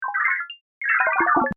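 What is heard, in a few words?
Amplitude, sonification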